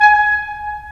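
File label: WND CL, wind clarinet; LA Composer vol. 3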